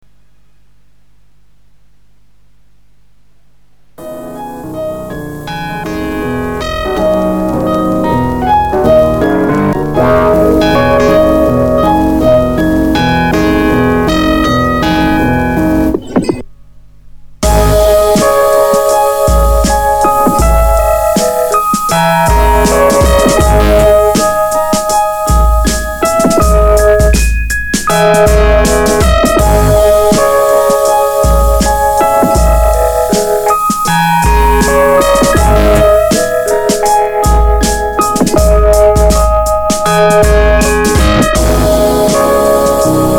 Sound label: piano